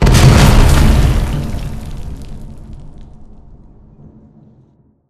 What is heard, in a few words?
Explosion Rumble Interior Close Punch; Boomtown; Titanfall 2